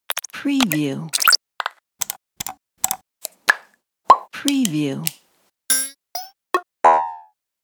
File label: click motion